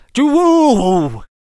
Gunslinger Attack Vocals

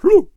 Bard Attack Vocals

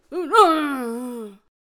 Death Vocals

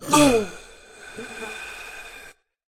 Wizard Death Vocals

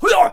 Hwacha Attack Vocals

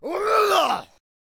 Berserker Attack Vocals

